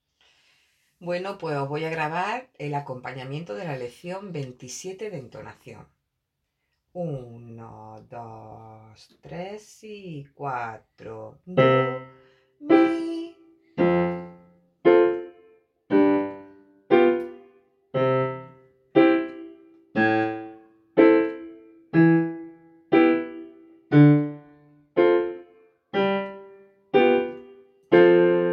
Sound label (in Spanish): Acompañamiento piano; material didactico dpto musica